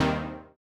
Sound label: Housey Stab D#2; KG MegaSaur